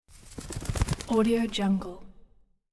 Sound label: quick bird wing flaps